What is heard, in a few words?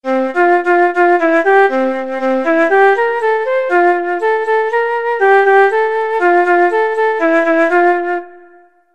flute